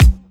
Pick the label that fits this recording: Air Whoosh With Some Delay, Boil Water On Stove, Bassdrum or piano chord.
Bassdrum